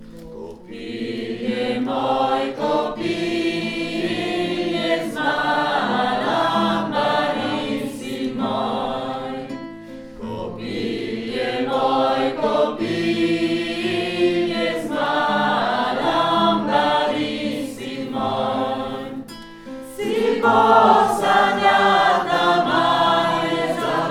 LEAD; Kopile moj kopile

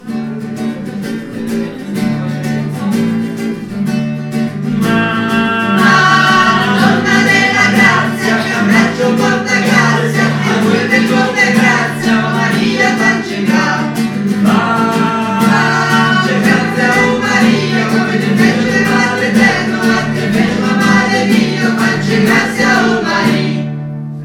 Madonna della grazia voix haute; St Jean

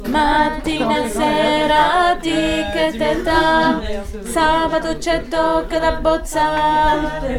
Cinturini VOIX

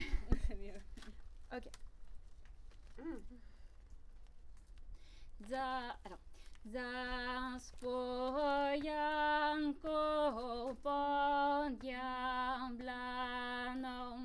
LEAD, Chansons; Zaspo Janko

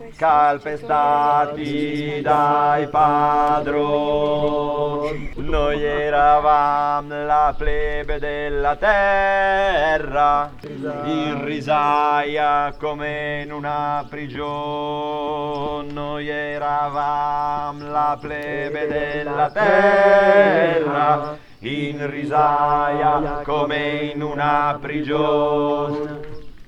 TENOR; Pellafol

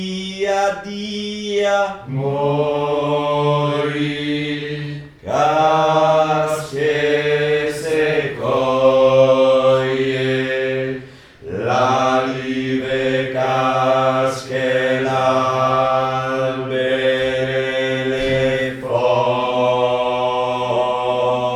HOMME; Cade l uliva; St Jean

